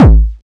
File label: kick